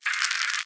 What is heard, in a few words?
Egg Shake Louder; The Inn